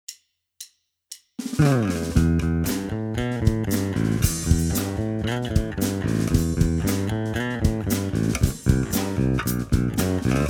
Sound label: river bass